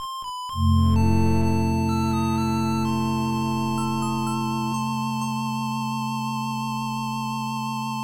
Comrades Jingle 2 (Sad Feeling); Shining Force; Sega; Megadrive-Genesis

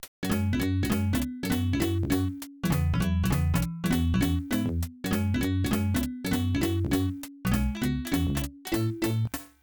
Janken Match Chorus; Alex Kidd in the Enchanted Castle (Alex Kidd - Tenkuu Majou); Sega; Megadrive-Genesis

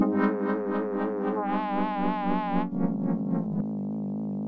Lose, Game Over; Vectorman 2; Megadrive-Genesis

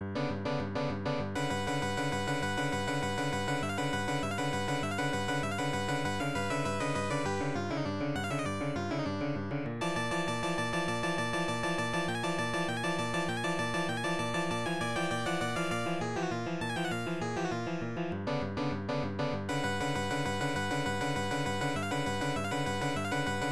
Sabre Dance; Ren and Stimpy; Stimpy's Invention; Sega; Megadrive-Genesis

